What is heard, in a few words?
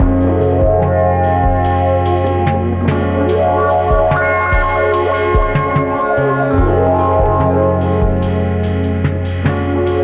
808 ambient jazz